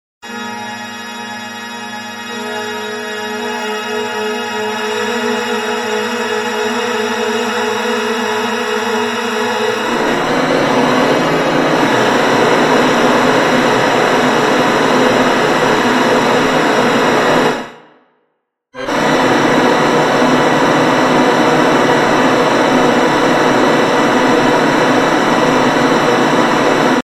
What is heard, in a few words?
reinhard organ; micro